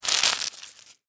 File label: Caterpillar, Bite, Chew, Munch, Leaf, Leaves, Insect, Bug